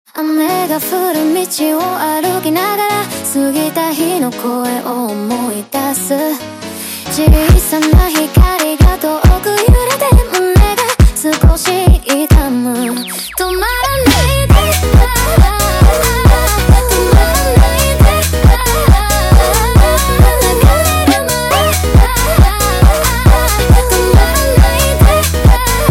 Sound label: Montagem sped Up